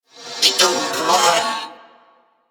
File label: Purgatory Warrior Possess Scream; Warframe